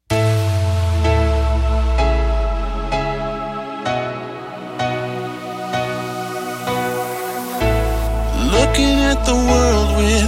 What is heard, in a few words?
edm; mixing and mastering samples